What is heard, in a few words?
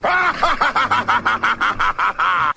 laugh devil